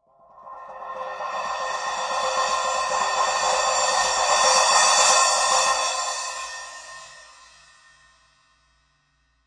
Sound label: cymbal mallet buildup; Cymbals; single hits